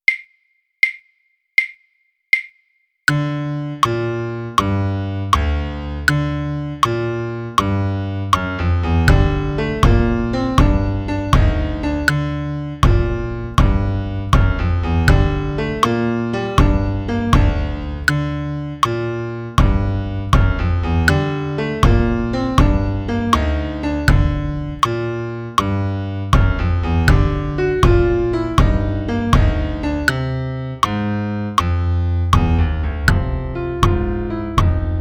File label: piano bass drum click